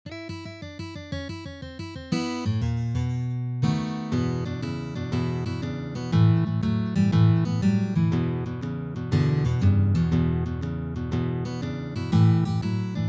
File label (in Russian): Blyuzovyj shaffl